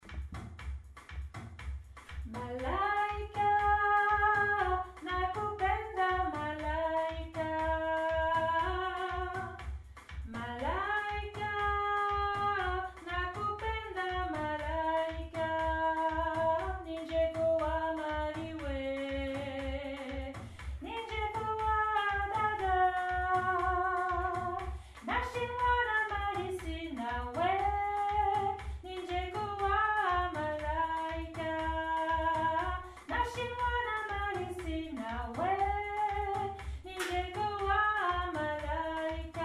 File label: Soprano